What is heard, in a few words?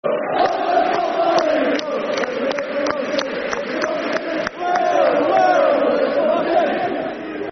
Eieieio Oldham [Football Chant (Soccer Songs)]; Oldham Athletic Oafc